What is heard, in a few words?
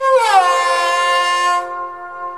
sarangi riffs